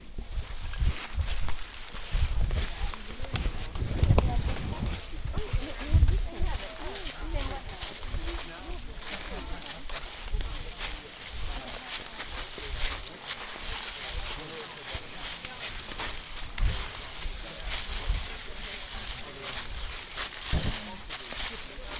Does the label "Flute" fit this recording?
No